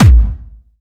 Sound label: Medium, kicks